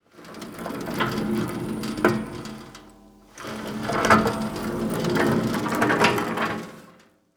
Emergency Gurney Hospital Gurney Rolling Down Hallway; emergency effects; AdobeCC